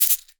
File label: Cabasa; Polyend; Simulacrum Sounds; 1 Shots; Acoustic and Electric; Drums